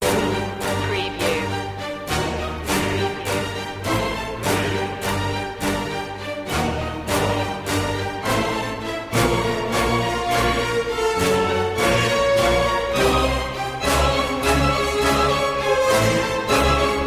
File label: no perc